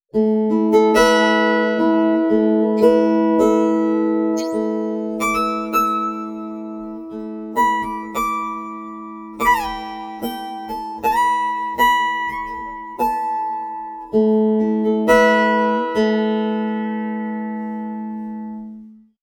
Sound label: pitchshift guitar